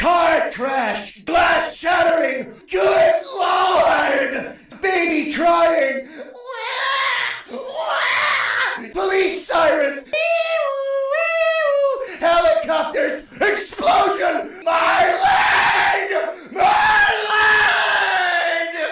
car crash

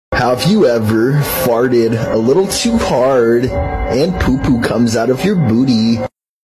have you ever farted a litle too hard

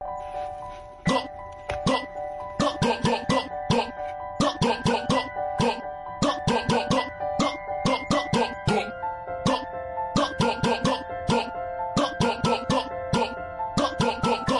gulp; inside out

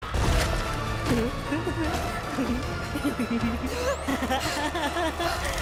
evil lil laugh

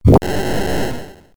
fireball; sonic the hedgehog

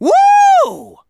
woooooo; sonic

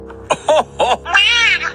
ho ho meow

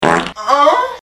fart moan not wet

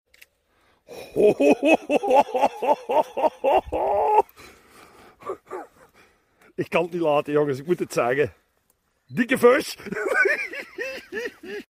vushh

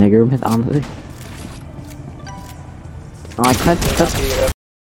oh hohoho

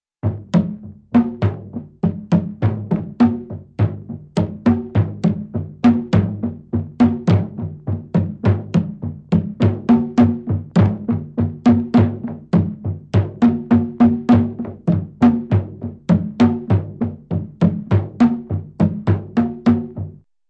african drum beats

